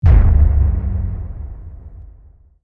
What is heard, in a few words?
Pillar Impact; ambience